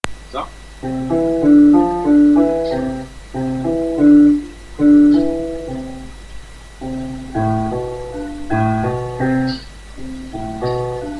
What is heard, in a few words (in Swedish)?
test musik